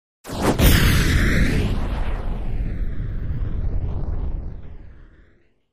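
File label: Bomb Medium Explosion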